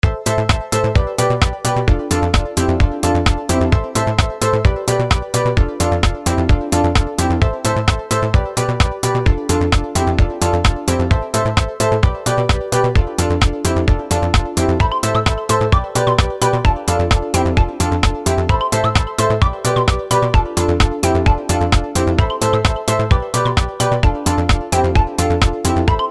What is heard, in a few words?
trance menu